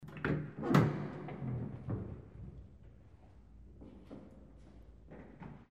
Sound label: Hinged opening